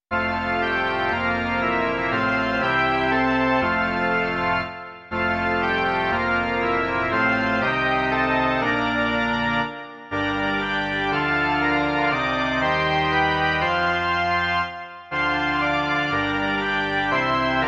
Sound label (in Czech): varhany; Slunce denní již nám hasne